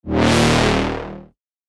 bass shot D#; Shore; revealed bass shots vol 4

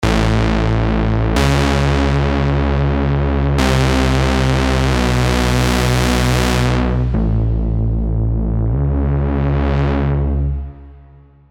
Reese; Diva; alonso 18 year anniversary pack; Hatewax

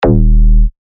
Bass Shots D#; Kinfolk; revealed bass shots vol 3

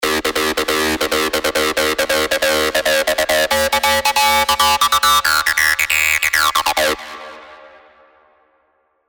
SQ Acid Calling; revealed spire mainstage drops

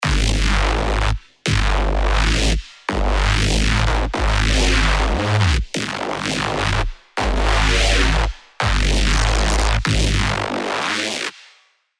The Great Re-Modulation; revealed serum 2 reese renaissance

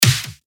Snare; Superstar; revealed claps snares vol 2

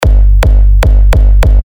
Kick G; Cleavage; terra kicks; Revealed Terra Kicks

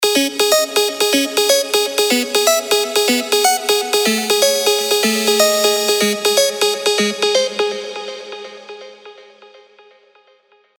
Soft Signal, trance melodic leads; revealed serum 2 trance melodic leads vol 1

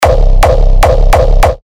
Kick C; Steady; terra kicks; Revealed Terra Kicks

